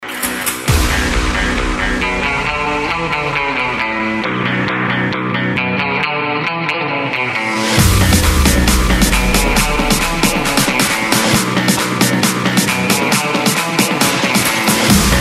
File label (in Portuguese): eletro funk; cd na batida do eletro funk